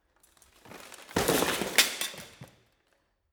bodydrop

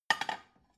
plate shelve soft short

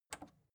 record player power release on

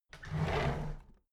drawer big clatter open heavy

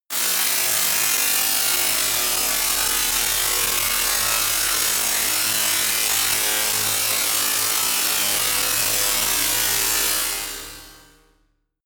buzz saw long

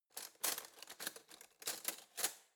cutlery spoon drawer handle long

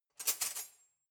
cutlery knife drawer grab

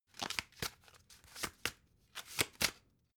playing cards shuffle handle

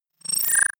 data transfer beam

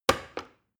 plastic button start click heavy slow

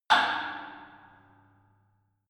metal impact tank inside

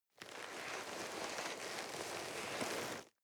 nylon leather soft rustling

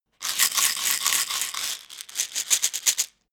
pillbox shake

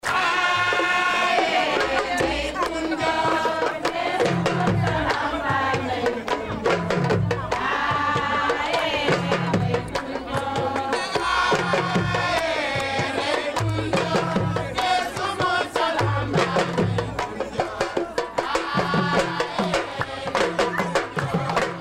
sajj in senegal

